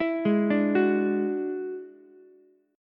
F sharp 7th Suspended 2nd Sharp 5th F sharp down Ukulele; arpeggiated